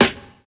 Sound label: acoustic snare sample a sharp key